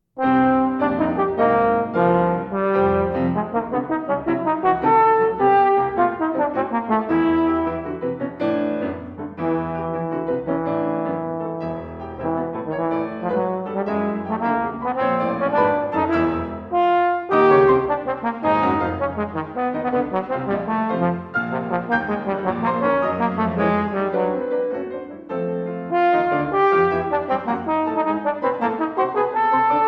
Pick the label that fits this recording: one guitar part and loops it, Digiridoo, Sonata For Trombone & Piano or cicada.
Sonata For Trombone & Piano